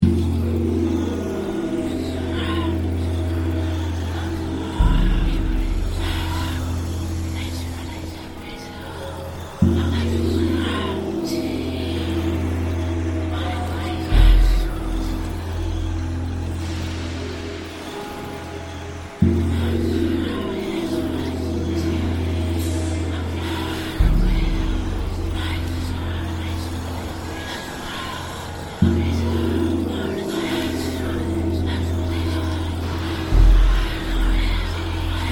atmosfera; svencoop; keenhalloween

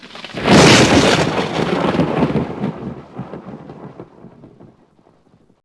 thunder heavy, thunderstorm; l4d2

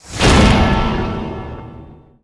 menu horror; l4d2

